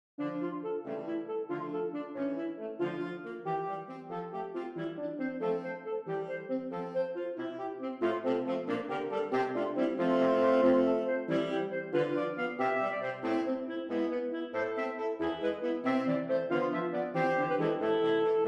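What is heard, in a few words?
Gigue, saxophone; Handel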